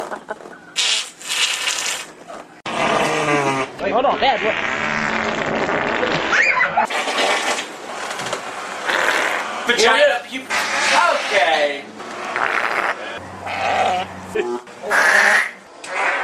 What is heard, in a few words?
farts